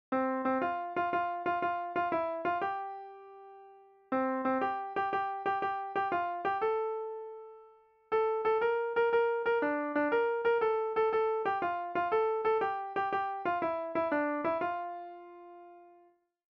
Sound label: if youre happy